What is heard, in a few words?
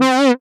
Synths Root C